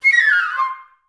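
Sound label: flute run down C3; Best Service; Peter Siedlaczek's Advanced Orchestra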